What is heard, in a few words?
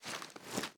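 sniper reload fast cloth arm; gmod; viper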